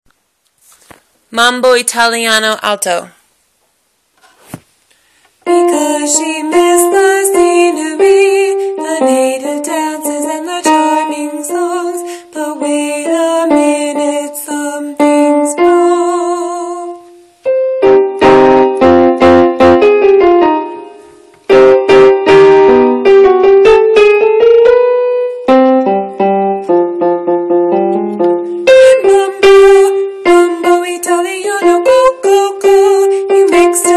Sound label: mambo alto